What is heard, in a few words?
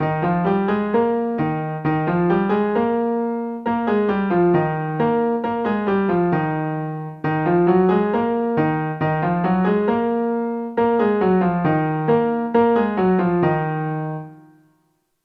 practice 16th note in E flat and E flat m